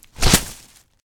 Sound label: bin